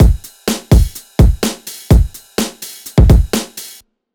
BreakBeat Loop 126 BPM

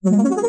Chord; STMPD

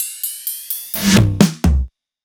Hexagon Fill

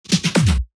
Fill

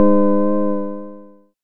pad

